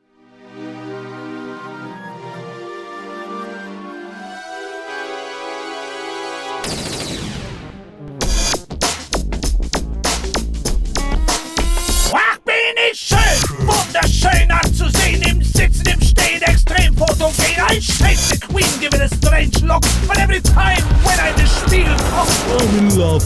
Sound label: rap; 7 Zwerge Maenner Allein Im Wald